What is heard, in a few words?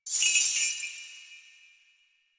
Naginata Whoosh Tonal; Warframe; Weapons; Melee; Koumei Naginata